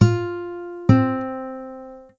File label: interval descending